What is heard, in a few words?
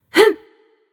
grunt attack; smite; mulan